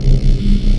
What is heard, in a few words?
Health Station Charge Loop; SFX Kit; Sci-Fi Loops